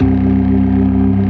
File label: 24 STOPS, Synth and Organ; InVision Interactive; Keith Emerson; The Most Dangerous Synth and Organ; ALL STOPS